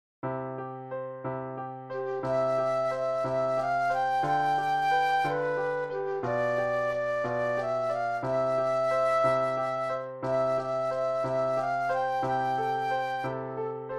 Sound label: Flute Solo; classical